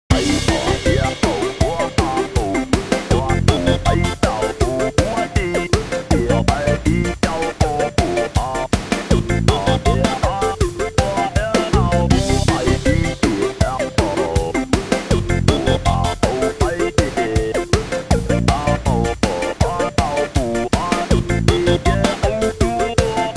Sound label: Machineland; runner3